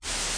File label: lofi Sega sample; Lofi Sega Samples